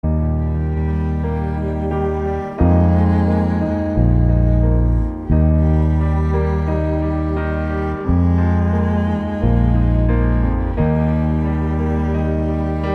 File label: instrumental harpa